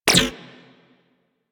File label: Shield Impact; Warframe; Volt